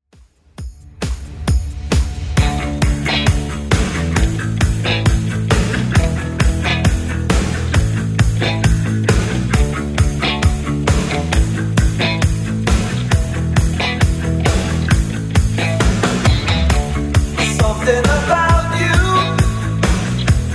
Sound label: 80s Redrum 134 bpm